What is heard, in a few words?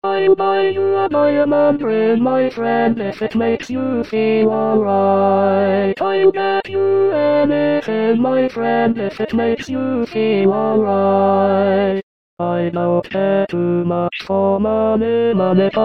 tenor